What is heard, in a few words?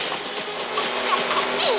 giggle; Baby Yoda